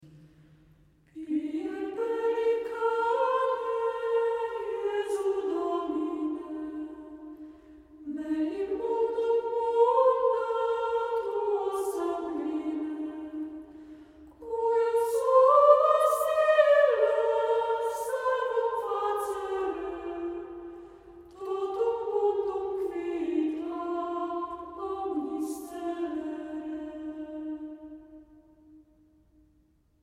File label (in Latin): Adoro te devote